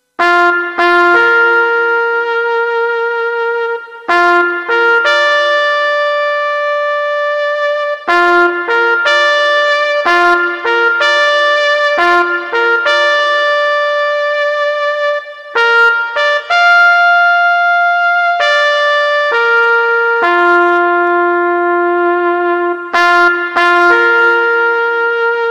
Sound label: Military Taps Sounds Car Horn